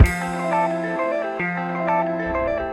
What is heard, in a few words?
Loop